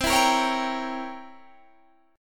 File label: C 7th Flat 9th C down Guitar Standard; C7b9 strummed; JGuitar